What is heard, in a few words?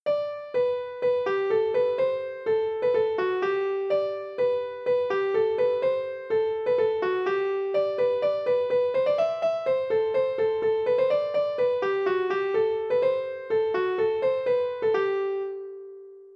polka; boeuf trad